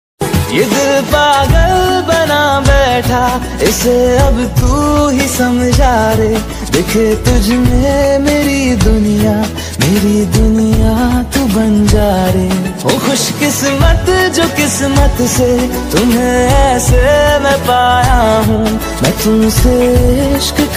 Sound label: Most Romantic Hindi Love Song Ringtone; ringtones